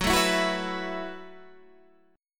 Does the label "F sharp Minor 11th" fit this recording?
Yes